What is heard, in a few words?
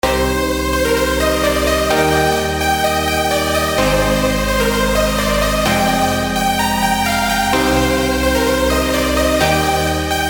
progressive house; Savage Sounds; Helios; Serum 2 presets